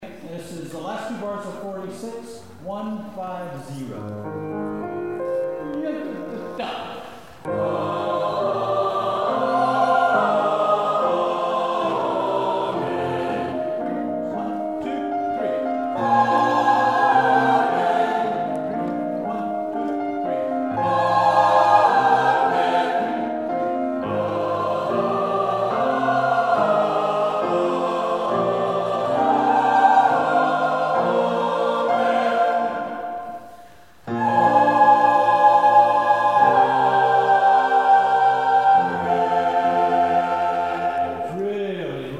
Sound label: Choir 3 only; rehearsal files